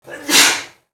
sneezing

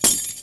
key drop

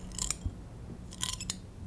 carabiner

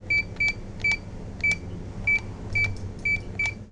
keypad dial

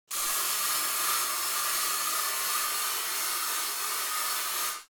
air spray

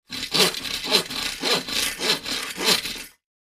hand saw

